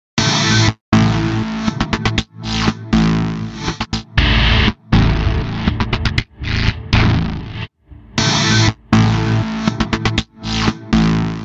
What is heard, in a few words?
guitars synth